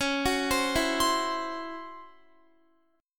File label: C sharp Minor Major 7th Double Flat 5th Guitar; C#mM7bb5; arpeggiated